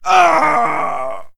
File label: man death; Mount&Blade Warband